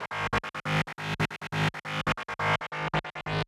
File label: Syncer Line DRY 138BPM; G# minor; Bassline & Melody; Vengeance Samples; VTS1 Zodiac Kit